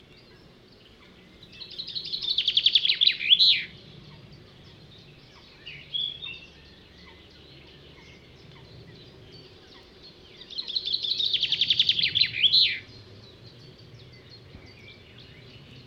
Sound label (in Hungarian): erdei pinty hangja